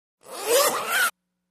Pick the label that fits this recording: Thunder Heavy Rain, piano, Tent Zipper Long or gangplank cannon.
Tent Zipper Long